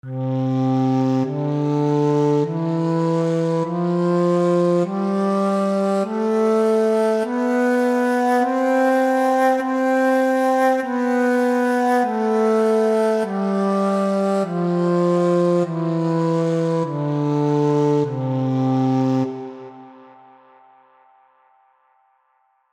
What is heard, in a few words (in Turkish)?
Kaval